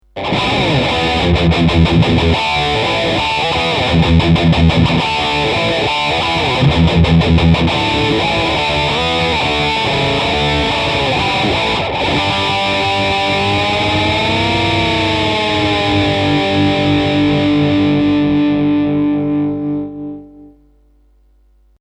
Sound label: Heavy Riff Bridge